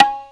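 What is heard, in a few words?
R8-PRC, R8 percussion; E-Lab; X Static Goldmine 1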